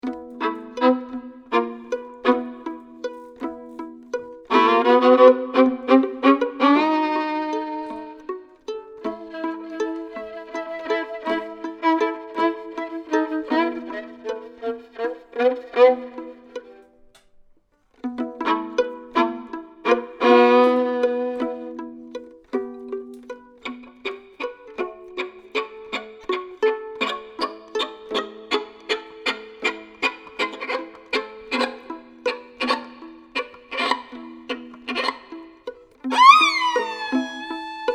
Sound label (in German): Soundimprovisation